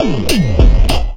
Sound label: Bumpers; Sickly Fx; Sonic Foundry (Sony Creative Software); Esoterik Beatz